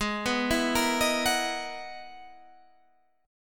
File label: E-Major Sharp 11th G sharp; EM#11/G#; arpeggiated; JGuitar